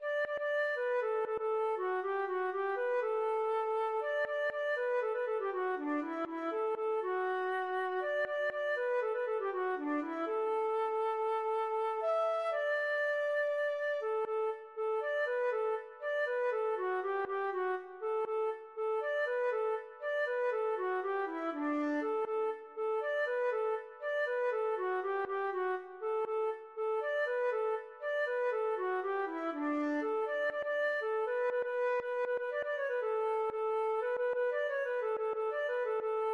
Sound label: grutiada, feadóg